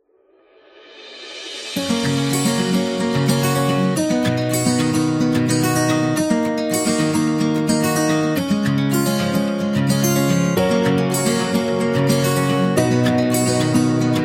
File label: Inspiring Wedding